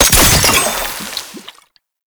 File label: Last Shot, Weapons; Warframe; Scaldra AK